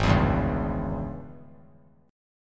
Ab Major 7th Suspended 4th Sharp 5th Ab down; strummed; JGuitar

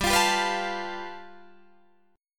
G 9th, Guitar Open D

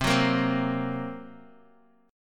F sharp Major Flat 5th

